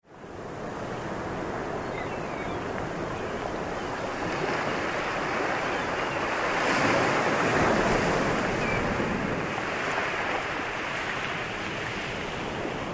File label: Beach Ambience